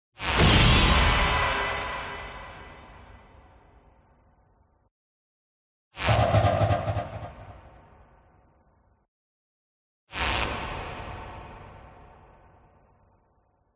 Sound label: CINEMATIC HIT Electric Shock; smartsound